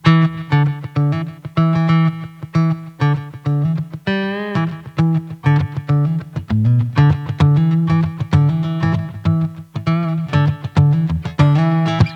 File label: Slapback Delay without Reverb, guitar; slapback delay reverb settings